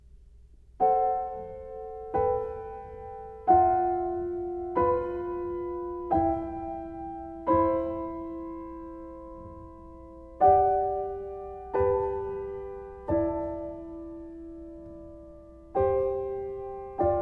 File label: Within Fourths Within Fifths